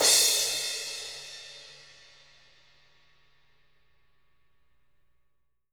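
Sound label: 16 crash fff, jazz crashes, Living Drums; Sampleheads; Peter Erskine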